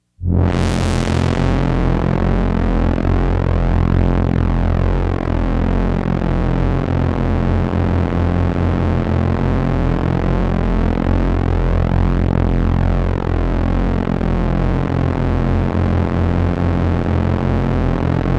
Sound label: drone